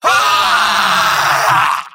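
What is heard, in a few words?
medic pain critical death; vo; tf2; mvm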